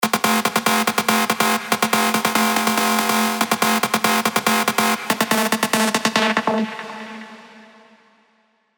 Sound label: Screech Hard Lesson, big room techno drops; Revealed Serum 2 Big Room Techno Drops Vol. 4